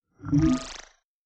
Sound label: Robo Body Electro Periodic Whooshe